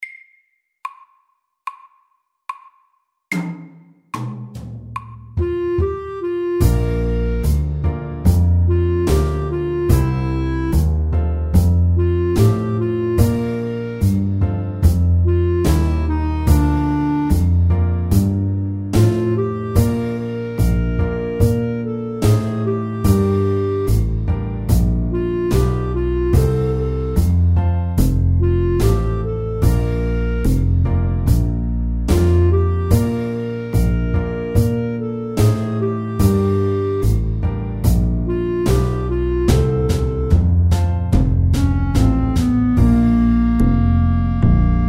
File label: Slow blues middels